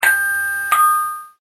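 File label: door chime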